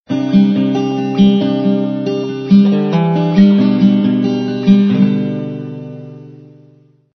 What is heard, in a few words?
love music